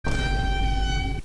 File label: scary meme sound